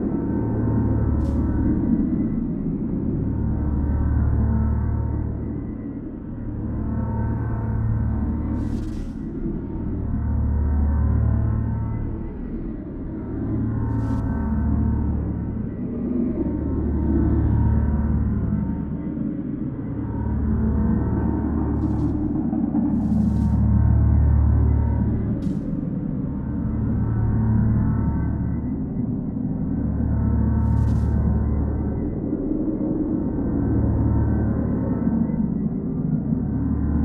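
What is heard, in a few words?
ambience shroud storm; Stellaris; cosmic storms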